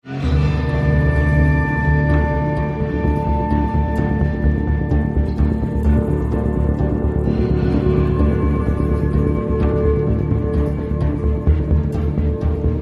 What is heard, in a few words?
Loop Tense